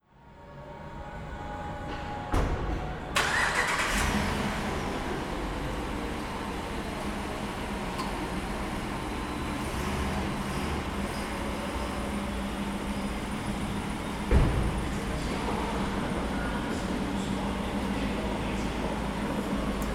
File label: kaufland bila hora; Garage under Kaufland Vypich; hudba